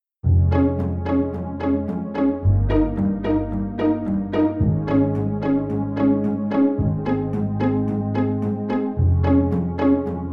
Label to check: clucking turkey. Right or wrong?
Wrong